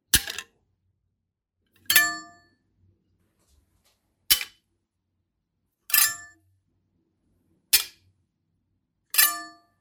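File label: knife attached to magnet on kitchen wall and removed, variants; Звук ножа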